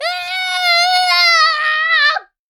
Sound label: Child Scream; Don't Be Afraid The First Toy